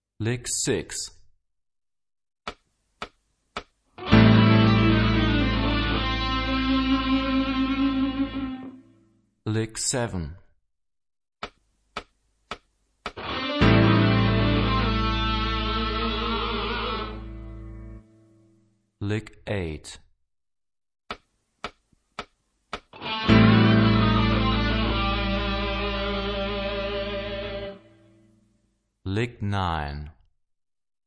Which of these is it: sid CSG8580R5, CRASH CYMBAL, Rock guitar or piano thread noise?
Rock guitar